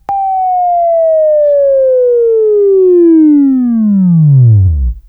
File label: Buzz, Left; Sweeping Training